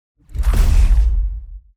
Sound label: Shot; 3Souls